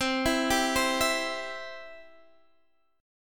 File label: C Major, Guitar Half Step Down